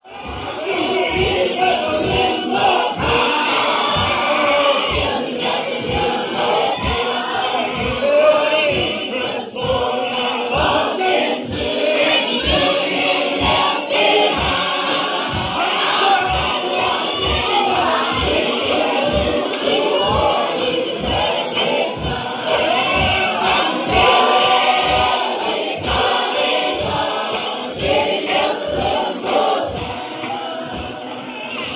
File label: harp